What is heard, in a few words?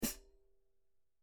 HH ZXT 14 RPR 14, rock hat